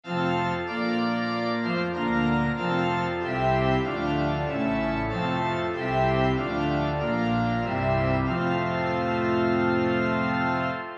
While Shepherds Watched Their Flocks By Night Organ 6 Verses